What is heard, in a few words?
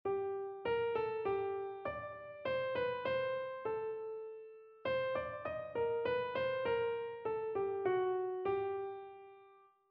melodic error detection